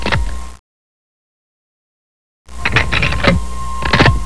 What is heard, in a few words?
load sharps; gunslinger